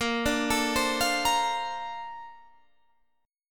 A sharp Major 9th, Guitar Drop D

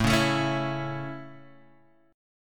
A Major, Guitar, Standard; strummed

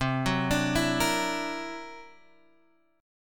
C 9th Flat 5th C down Guitar Drop D

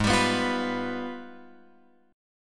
G sharp Minor Major 13th; G#mM13; {4 X 5 4 6 7} strummed

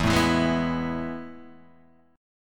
A Major 7th Suspended 2nd E

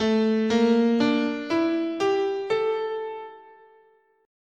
A Traditional Japanese "in sen" scale

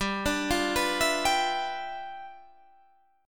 G 13th G, chord

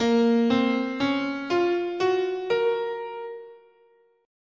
A sharp Hirajoshi, scale

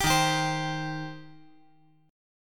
D sharp 6th, D sharp, down; strummed; JGuitar

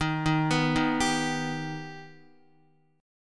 Eb Augmented, Guitar; Eb+ arpeggiated; x,6,3,4,3,3; JGuitar

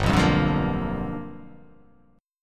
G 7th Sharp 9th G chord

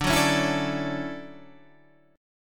D-Major 9th D

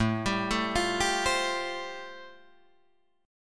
A-Minor 7th Sharp 5th, Guitar Drop D, chord

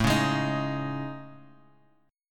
A Major Sharp 11th, Bass Standard; AM#11; strummed; JGuitar

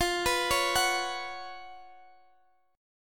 B Suspended 2nd F; Bsus2/F; arpeggiated

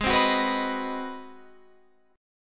Ab 7th Sharp 9th down Guitar Open F; Ab7#9 strummed; Ab7#9 Chord

